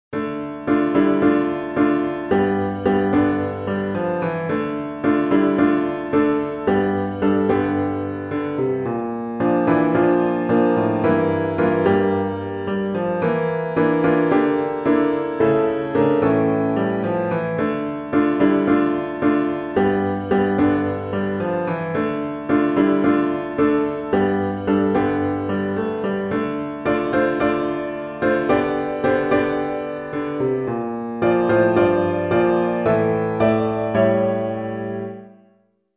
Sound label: piano all parts; Psalm2